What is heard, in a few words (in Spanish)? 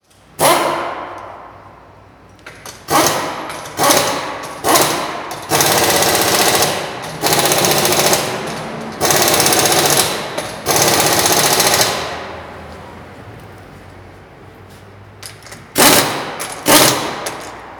Montando Ruedas en el Vehiculo